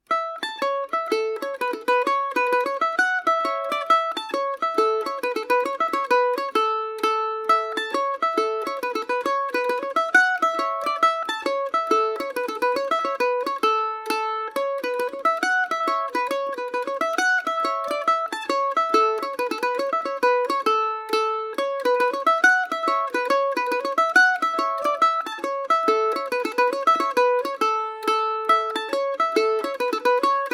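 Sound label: A major, mandolin; Paris Swing Macaferri mandolin; Gale Bridge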